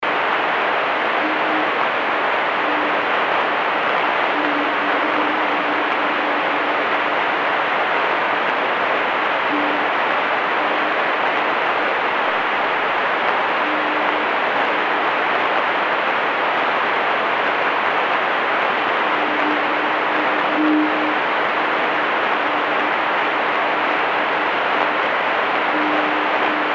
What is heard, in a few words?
WWV 25MHz CW